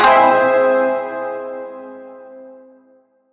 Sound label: Shawzin Phrygian Chord I; Chords; Warframe